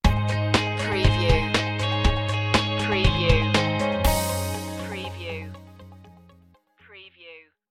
stinger

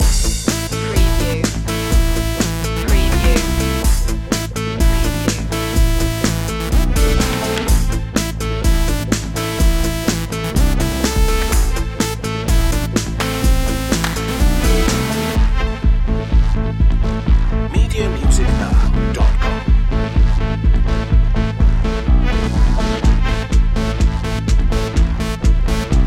retro video game krypton loop

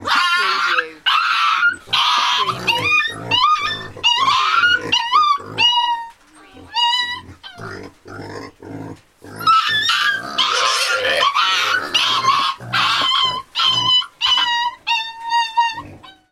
pig and dog whimper

